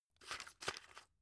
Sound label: drug put in; berufe; arzt